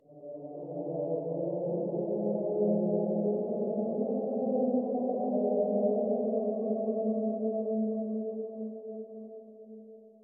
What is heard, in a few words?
ambience chanting; gamerip; Rage in Peace